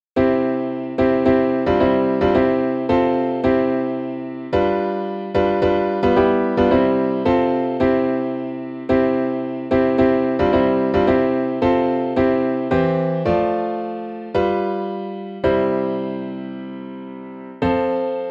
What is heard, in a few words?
At Calvary